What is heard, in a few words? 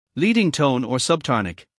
leading tone or subtonic